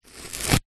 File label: household band aid plaster strip peel from skin close up